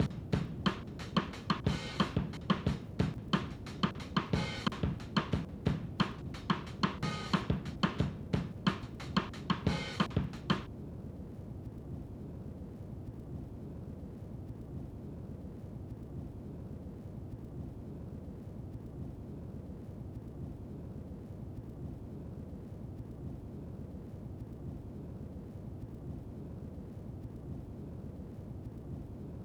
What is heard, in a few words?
drum loop groove 90